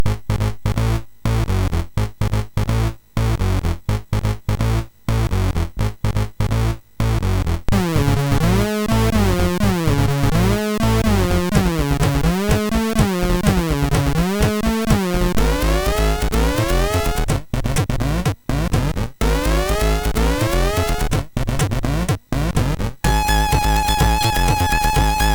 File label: Technobeat sid MOS6581R3